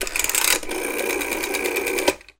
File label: Rotary phone Sound